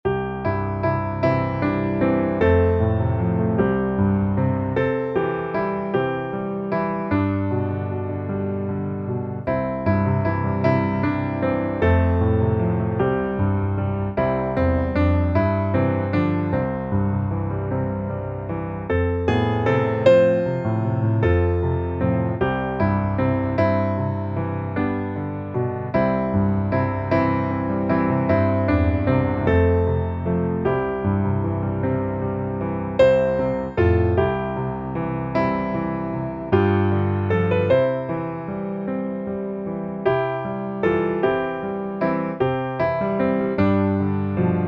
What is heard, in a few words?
piano